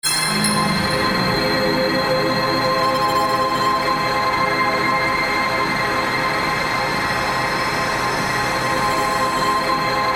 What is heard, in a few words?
celestial sweep light